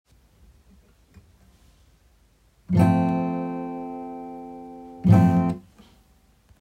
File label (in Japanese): ミュート